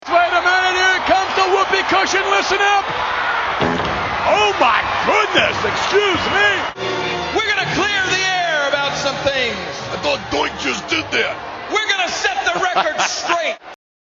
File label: whoopee cushion